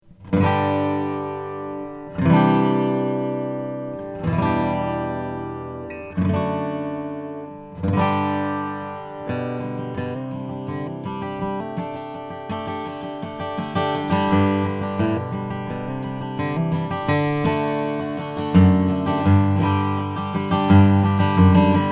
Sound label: larrivee lv 10 koa; custom mastergrade koa